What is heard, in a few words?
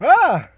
surprise